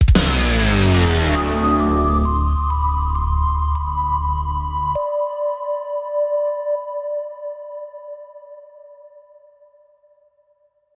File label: GAME OVER MAN, GAME OVER